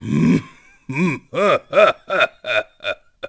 Triforce Laugh; Ganondorf

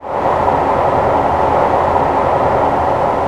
Pirate Ship Float; The ship floating in the air (final 102,816 bytes loop); Zelda

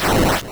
Turtle Rock Shed; Zelda

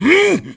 Block Dodge; Ganondorf; Zelda

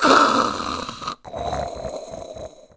Snore; Salvatore snoring (Japanese version); zelda sounds

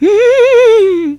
Oooh; Madame Couture: "Oooh..."

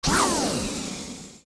Sword Spin Magic; Zelda; OOT

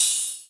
Attack Magic Stars; Magic Star noises from Zelda's stronger attacks

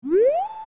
Z Target Center; Zelda; OOT

